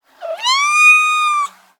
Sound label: Kitten Meow; افکت صدا حیوانات; Animals